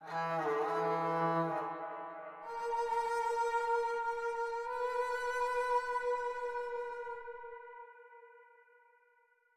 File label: music interactive; World of Tanks